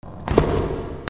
RIPPING

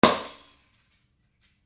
BREAKING

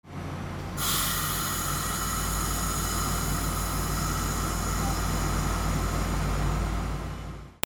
TRAINS

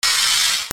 BATHROOM

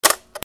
CLICKS

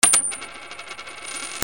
MONEY

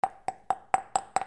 BANGS

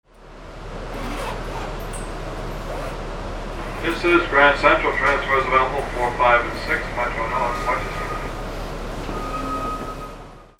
AMBIENCE

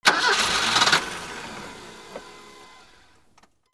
CARS

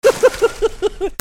PEOPLE

